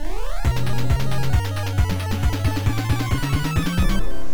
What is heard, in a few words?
Over Game Over; Summer Carnival '92 Recca; Family Computer